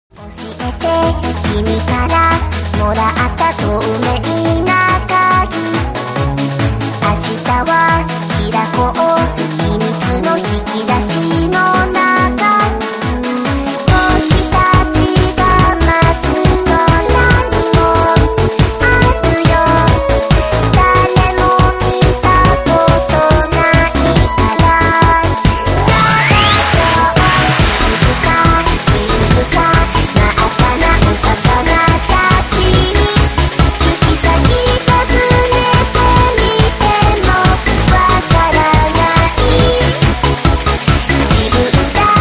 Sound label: Electronic Twinkle Star